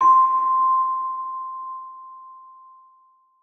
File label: Long Bell High C